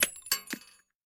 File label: Bullet Fall; Game rip; MiSide